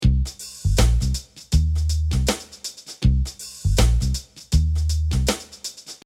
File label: Reggea Dry